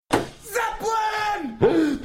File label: Fake Punk Sms